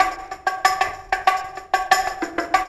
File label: LOOP; E-Lab; X Static Goldmine 2